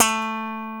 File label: FUNK BS A4, slap bass